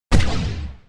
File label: gun